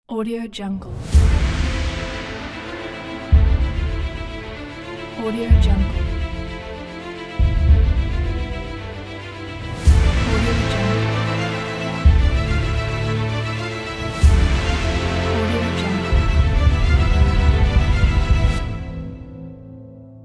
epic strings ident

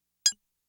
blip tone single high pitch synth blip staccato; prosoundfx

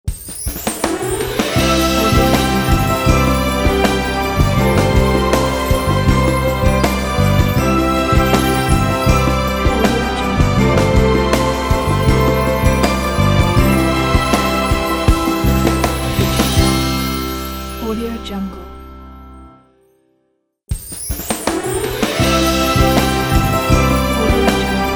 christmas logo

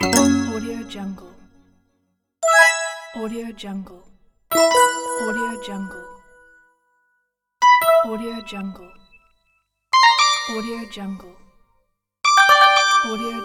interface button sound pack

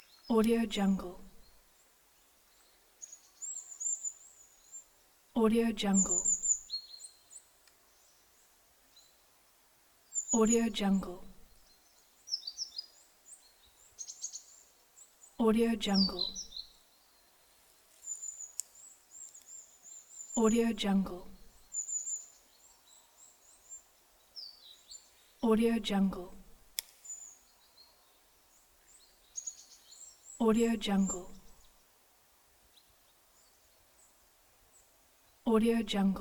forest woodland loop

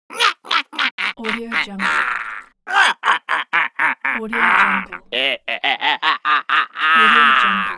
troll crazy laughter

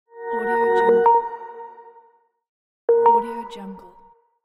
the short logo

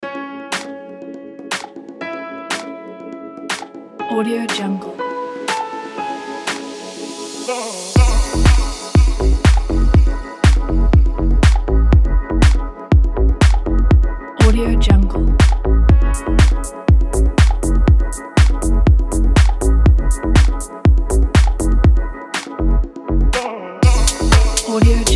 luxury summer deep house

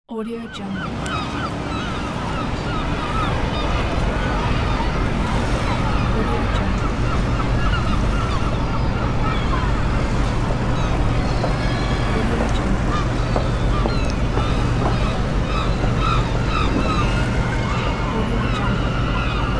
harbour ambience

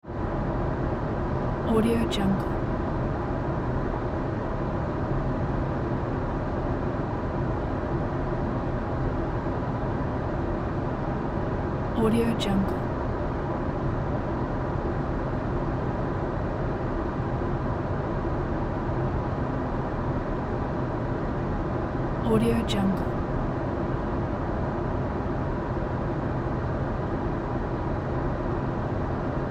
airplane interior ambience

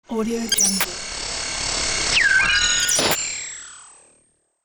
glitch anomaly

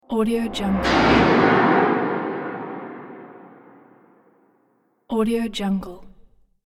whoosh transition explosion

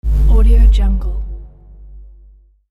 insert dubstep

